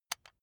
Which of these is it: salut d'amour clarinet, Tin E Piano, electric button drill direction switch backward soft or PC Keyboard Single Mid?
electric button drill direction switch backward soft